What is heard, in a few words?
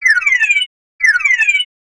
Sci Fi Alarm Loop; 1001 Sound Effects